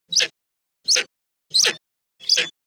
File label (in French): Aigle royal